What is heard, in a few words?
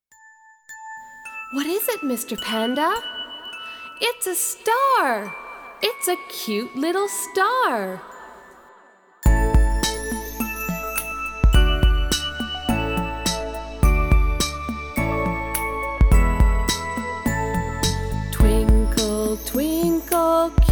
Cute Little Star